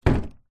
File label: household door internal wooden modern close medium hard close up